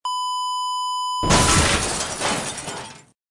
explode; agent 8 ball

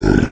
snail die; edgar; enemy

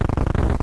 hero pushes; zsdx

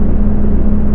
electric, engines; stunt rally

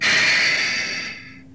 parking brakes; rigs of rods

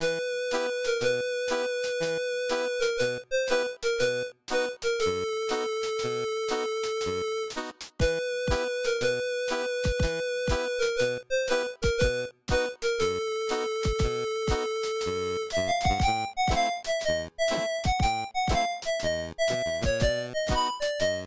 blacksmith, music; project hawk

